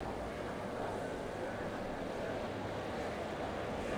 rushing air and distant echoing voices; ambience

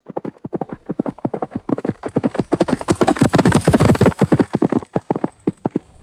horse galloping past on hard dirt; footsteps

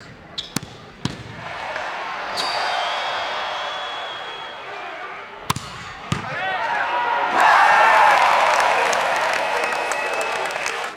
baseball bat crack and crowd cheering; crowds

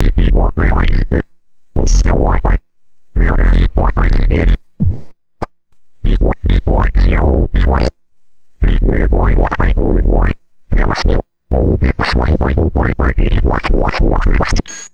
low frequency analog synth robot glitches; robots

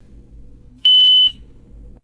short high pitched electronic beep; alarms

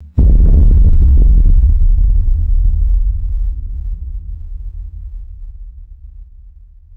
deep cinematic bass hit and rumble; designed

